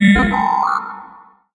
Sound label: Data Input Confirmed, stinger